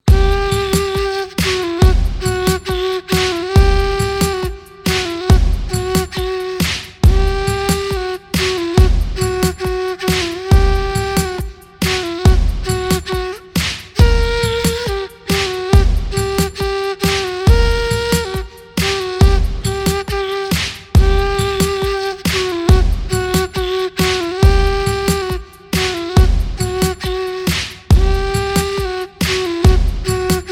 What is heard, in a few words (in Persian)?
ریتم های مذهبی برای محرم